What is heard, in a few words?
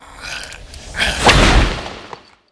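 ant attack, monster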